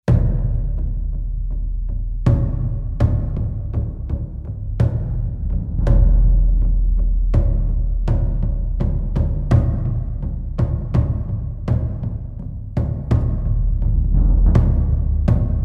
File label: Drum; Zuldazar